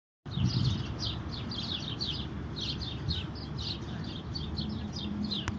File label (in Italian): Passera d Italia Passer italiae; Filippo Ceccolini